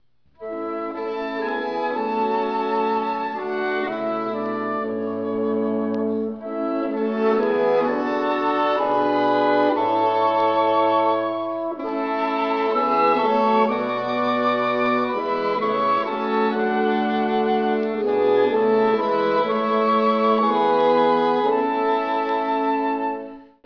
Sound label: chorale, quintet